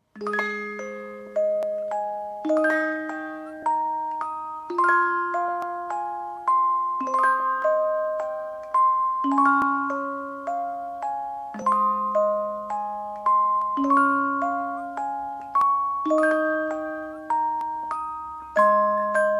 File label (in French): canon 30 lames japonais